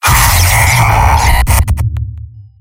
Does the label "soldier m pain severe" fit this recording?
Yes